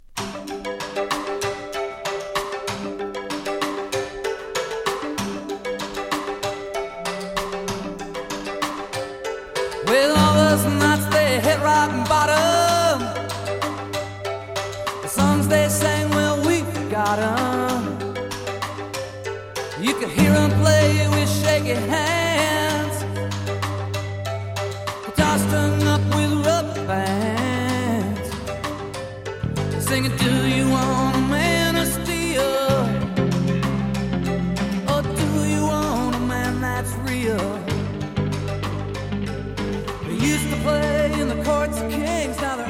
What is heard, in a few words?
steel strings